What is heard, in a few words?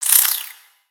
Spider Alert; Lego; aronwk collection; ndaudio; creatures mechanical